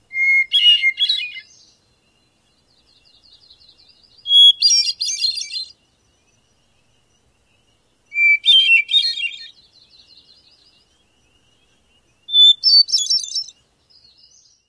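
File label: Hermit Thrush, song number 1; NY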